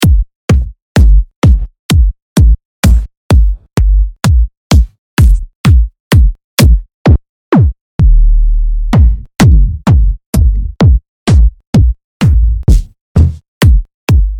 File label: edm drum kits